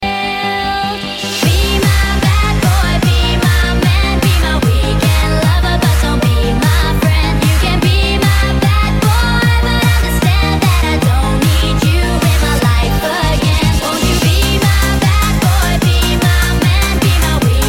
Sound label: trance; quake